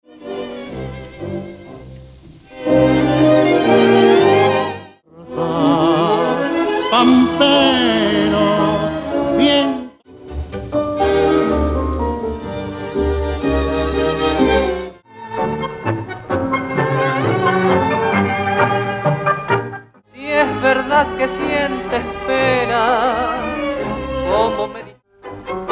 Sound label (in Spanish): tango